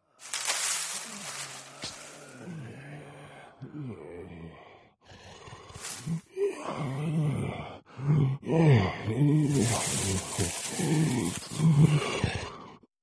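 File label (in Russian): зомби в лесу, шелест листьев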